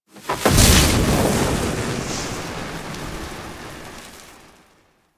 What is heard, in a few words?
Necramech Closet Fire Burst; Warframe; Entrati; Necramech Hack